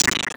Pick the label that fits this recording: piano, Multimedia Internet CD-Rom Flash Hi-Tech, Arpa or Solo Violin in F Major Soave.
Multimedia Internet CD-Rom Flash Hi-Tech